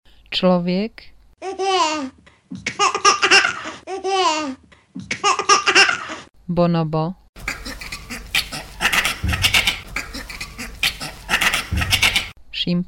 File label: primate LAUGH